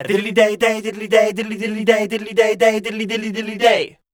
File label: SCAT, Voice Spectral